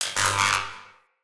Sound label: Cannon Tesla Recoil; Weapons; Player